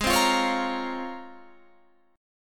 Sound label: C sharp Major 13th, chord